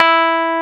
Keyboards Old School, E3; USB Soundscan vol.09; AKAI

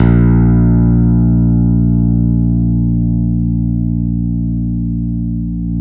A#1 MP, Piano cp80

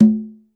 Conga L, Standard Kit, Yamaha PSR-E323

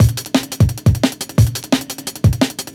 DNB BREAK; m8 breaks

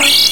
Haunted Pipe Organ; Porky Pig's Haunted Holiday

